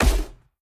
armor impact; smite; lancelot

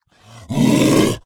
zombie brute run; smite; npc; roman archer

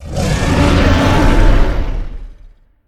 dragonhead; smite; kukulkan

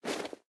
Clothes; Smite; Susano

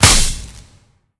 pwr hit; smite; gilgamesh

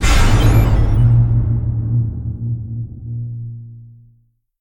Darkness Hit; smite; xbalanque

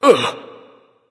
surprised; smite; hou yi

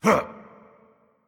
hitreact human male small

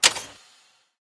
click; smite; chronos

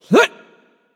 grunt jump; smite; terra

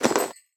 armor light; smite; chiron

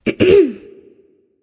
nv clear throat; smite; voicepack; ratatoskr

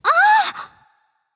surprised; smite; voicepack; jingwei

